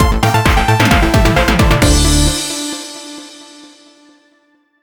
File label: MUSIC EFFECT Space Synth Negative; stereo; Universal Sound FX